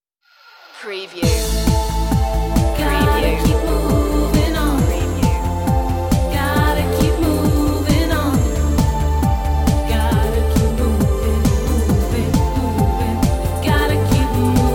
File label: vocal